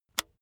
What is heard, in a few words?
razor attachment extend